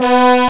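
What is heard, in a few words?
trumpets; CMI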